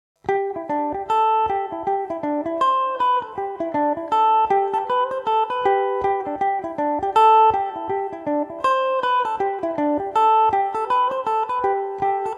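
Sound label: clare reel G major revelation, mandolin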